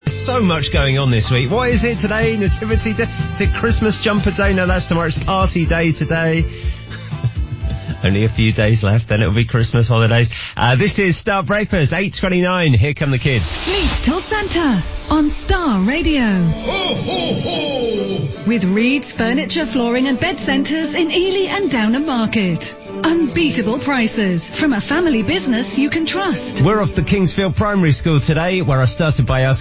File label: Choir Radio